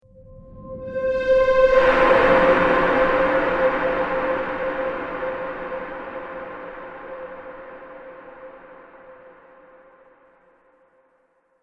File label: Trailer Sweep And Hit; Miscellaneous Impact Sound Effects